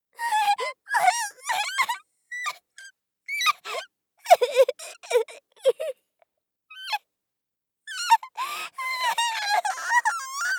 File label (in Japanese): 怯えながら; 効果音なし